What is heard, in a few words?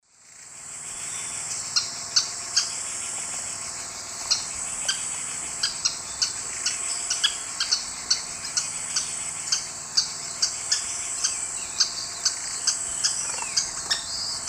Myiozetetes granadensis, calls; S. America; Eastern Lowlands